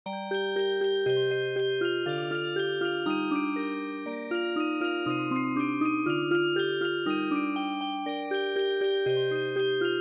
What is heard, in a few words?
Music Box, traditional